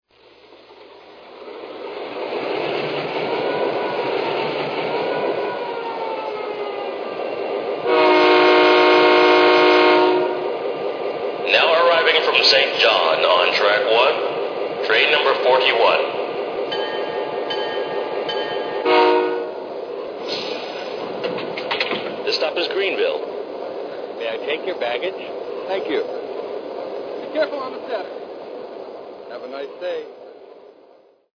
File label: E8